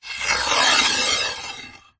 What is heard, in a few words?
sport snooker cue slide table edge; zapsplat